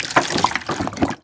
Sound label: blood bank touched, feedback